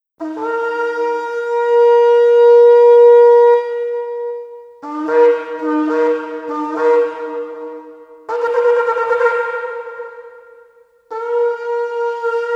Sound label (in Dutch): Sjofar; messiaansesjoel